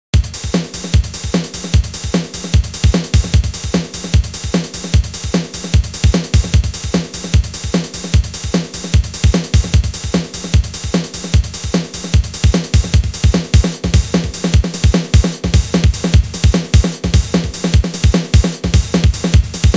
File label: Wardrums